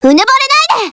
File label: grenade; csgo